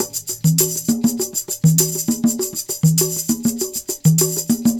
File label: Percussion, R&B Drums